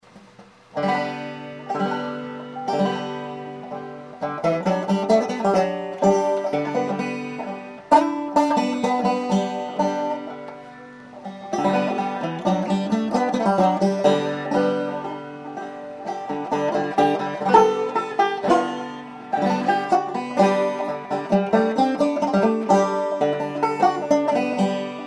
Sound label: banjo sample